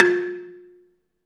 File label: BELA2 F3 L, BELAFON; USB Soundscan vol.60; AKAI